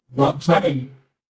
ui modal

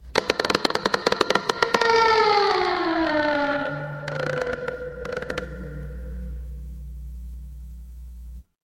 horror creak

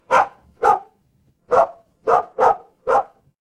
animal dog bark

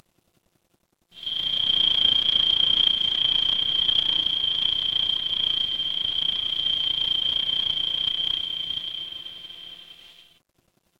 ambience forest

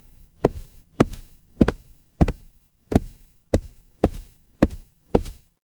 footsteps wood

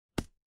impact punch

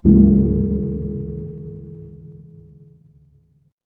instrument gong

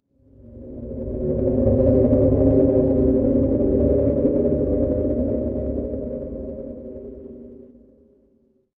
horror drone

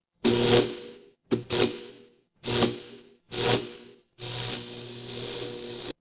fire electric zap

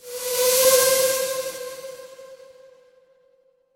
transition whoosh

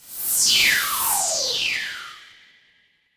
magic scifi laser

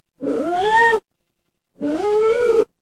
animal cat meow

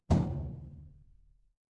impact metal hit

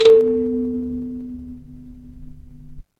instrument kalimba

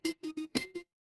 feedback confirm

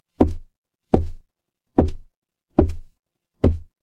footsteps wood

